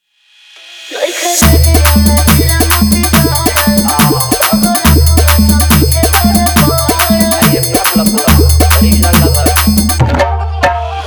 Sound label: Bhojpuri Remix Song